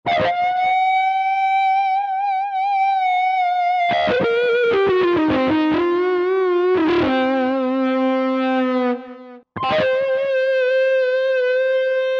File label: Dist Gtr, Roland JV-2080